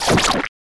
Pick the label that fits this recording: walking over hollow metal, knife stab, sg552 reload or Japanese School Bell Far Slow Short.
knife stab